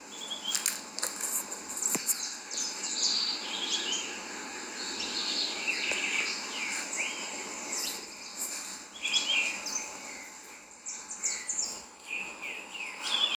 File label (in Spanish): Tuquito chico